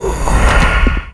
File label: mob raid die; 혈면귀사마벽